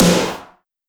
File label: breakbeat